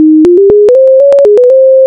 Fast Fight Song

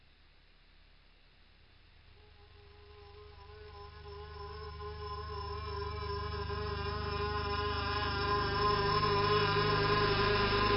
slow reversed

